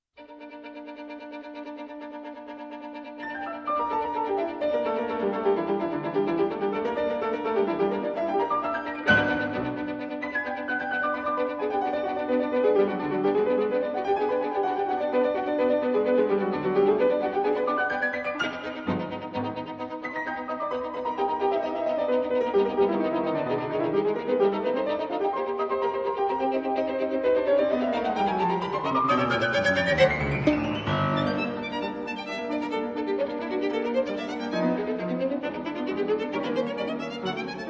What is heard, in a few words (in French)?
Sonate violon piano